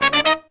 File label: Pop & Funk Brass